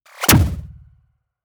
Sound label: crossbow arrow hit; future weapons 3; soundmorph